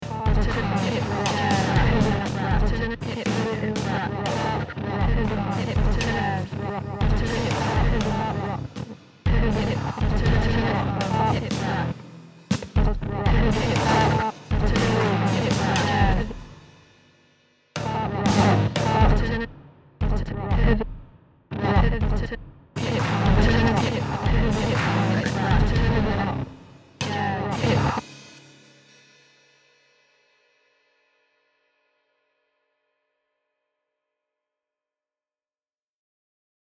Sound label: Heavy Metal